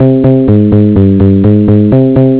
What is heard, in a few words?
tune